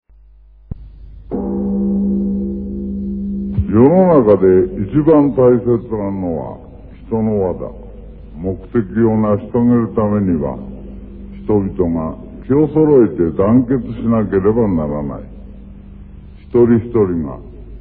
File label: shot